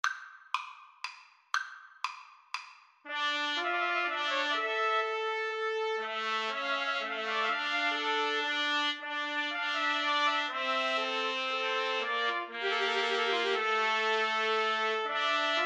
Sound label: pastorale TPT trio, Trumpet Trio